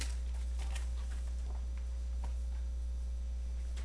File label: beat